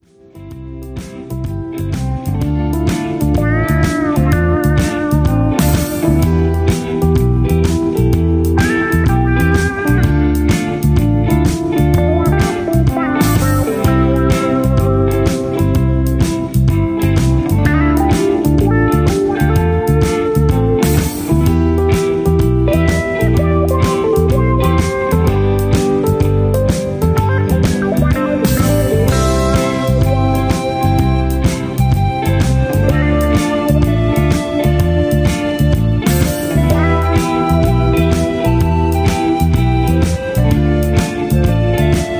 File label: Instrumental with Leads